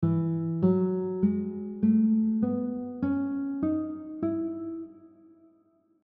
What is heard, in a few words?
E Major scale example one octave, guitar; E Major Scale one octave notation